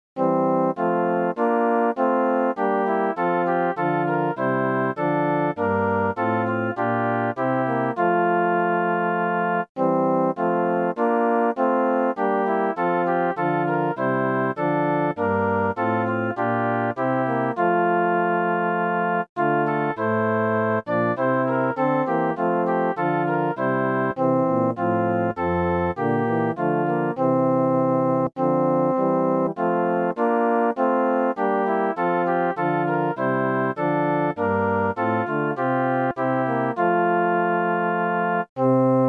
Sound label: O little town SATB; Choir